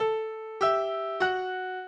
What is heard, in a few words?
minuet, mozart